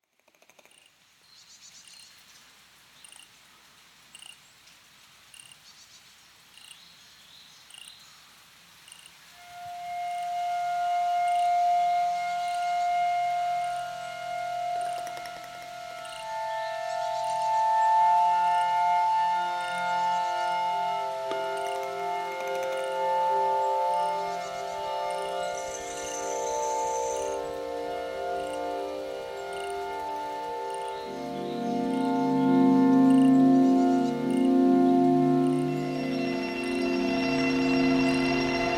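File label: Acoustic Sketch with Takataka Cricket; Guitar work; Solo premiere concert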